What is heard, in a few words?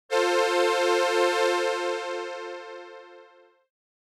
Strings; opxpro2; Riddle